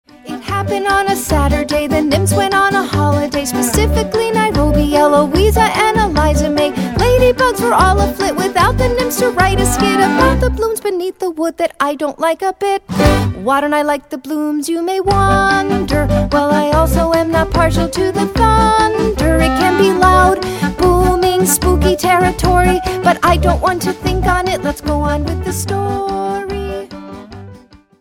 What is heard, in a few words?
echos song